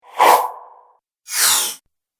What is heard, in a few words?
Whoosh Duo Pack; Whoosh Sound Effects; Andrew Fleming